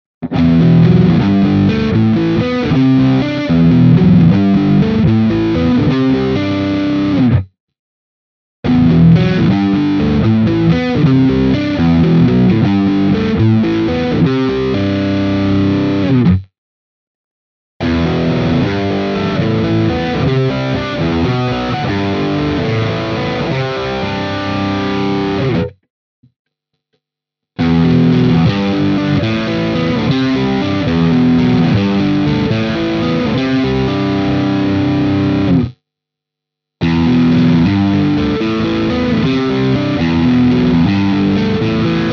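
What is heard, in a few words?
Hagström rhythm Riff B all positions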